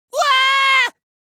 wahhh

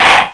slamdown; godot

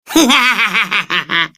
laugh

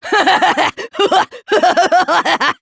laugh

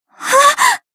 scared; asahina

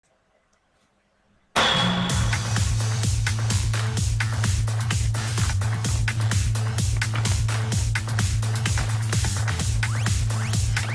rocket

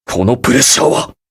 annoyed; gundam

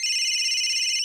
phone throw; florent

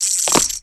grapple down; phantom

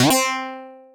spring; sonic; sonic cd

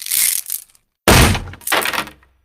slam; nahyuta

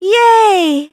yay; chihiro

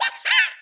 yaha; kzdokuroku

